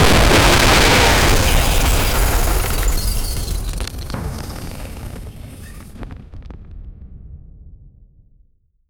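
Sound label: epic explosion of an asteroid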